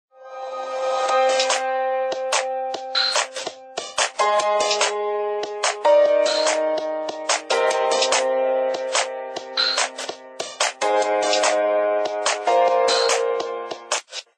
Ring Vision; Samsung SGH-i780

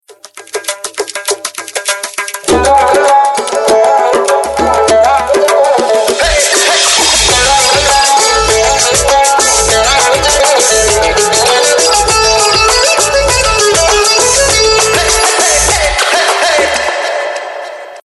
Jugal Bandi; Samsung; SGH-F480; India

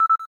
cam timer last

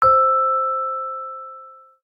emailtone; Jolla; The Other Half

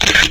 Shutter; LG; CU515

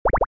Keytone soft; LG SU780 Lollipop 3

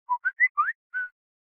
Whistle; Samsung Galaxy Pocket

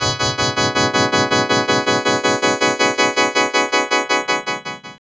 Pulsive, Alternative Post Rock; Sony CLIE PEG TG50

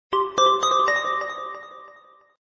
message, notifications; Gionee; GN305

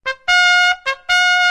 reveille; Sony; Xperia Tipo

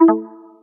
system disconnected, accessibility; BlackBerry OS-10

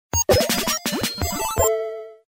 power on game

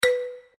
dial tone marimbas del; Oppo Neo 5

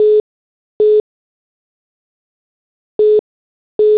call wait; Samsung X01SC Omnia PRO

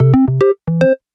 8 bit Bounce; Samsung Galaxy S22

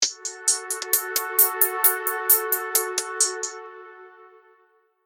pink alert, notifications; Jolla; nadeshiko; The Other Half

